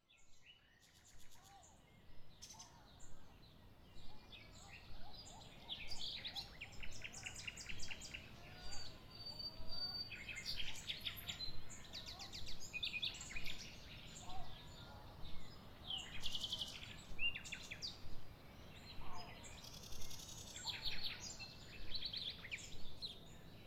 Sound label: amb generic outside day; Lucius III